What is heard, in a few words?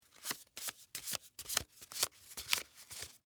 Game Cards, Shuffle, Stack, Small; Sports, Board, Chance, Cards